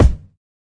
lofi kick

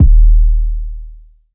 trap bass; multisampled; 7rystan Trap Bass

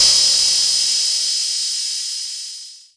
606 cymbal, drum machine; 606 Basic

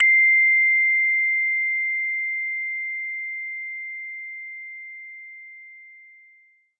bell instrument note; Multisampled Bell Instrument

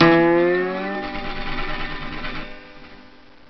Slide Guitar

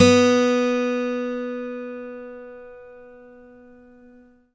6 String Steel Guitar B2; six string steel guitar

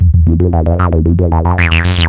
Acid, SYNTH AND ELECTRONIC; MELODIC SAMPLES

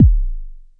analog dubb, Kicks; single hits

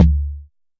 Jomox MBrane, drum machines; Jomox MBrane 11

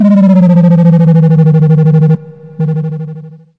bumble bass; Basses

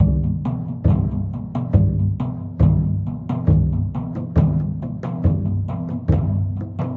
138 bpm, native sky drums

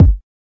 kik

